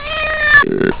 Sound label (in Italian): Gatto, Rutti